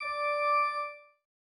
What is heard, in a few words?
organ